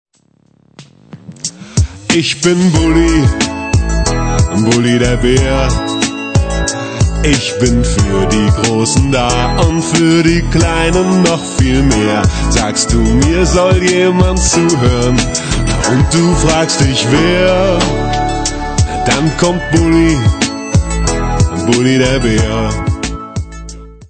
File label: song